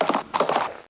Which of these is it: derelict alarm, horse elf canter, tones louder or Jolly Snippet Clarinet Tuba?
horse elf canter